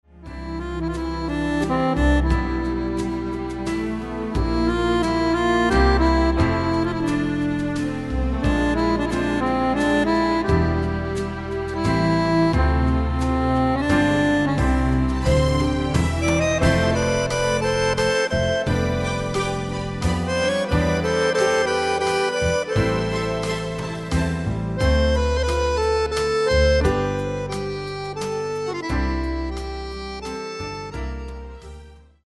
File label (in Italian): Atmosfera